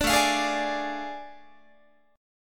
A Major 7th Suspended 4th Sharp 5th, Guitar; AM7sus4#5; strummed

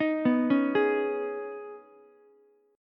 Eb 7th Suspended 4th Sharp 5th, Ukulele; Eb7sus4#5; arpeggiated; 8,11,9,11; JGuitar

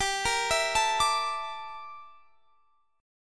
G Minor 6th Add 9th G down Guitar Standard; Gm6add9; arpeggiated; JGuitar

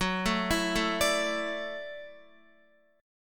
F sharp Minor 7th Sharp 5th, Guitar; arpeggiated; chordshape; x,9,9,9,9,10

